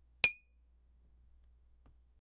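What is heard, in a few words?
cinematic sound of a glass, impacts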